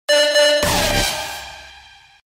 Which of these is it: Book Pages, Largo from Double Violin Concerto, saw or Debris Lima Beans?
saw